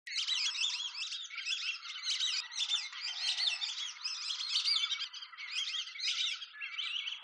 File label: Dendrocygna javanica